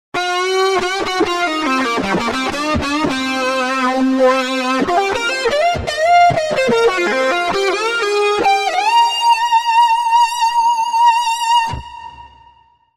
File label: Wah Lead; TURBO VL